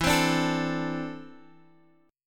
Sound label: E 7th Suspended 4th Sharp 5th E down Guitar Half Step Down; chord